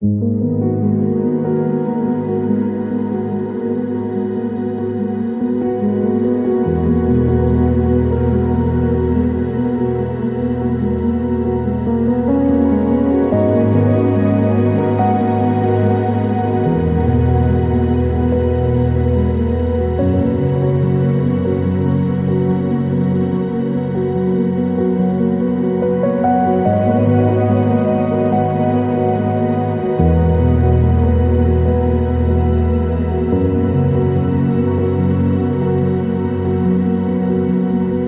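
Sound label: Painted, ambient piano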